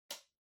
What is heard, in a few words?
Vacuum Cleaner Far Switch